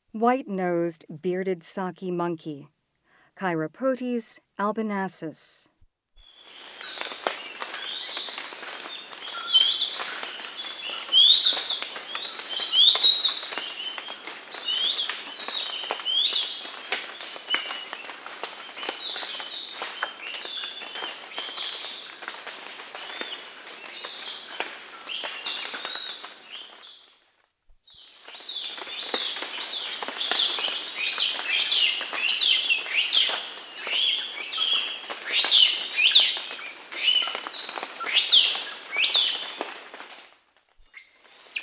White-nosed bearded saki monkey, Primates of the Rainforest; S. America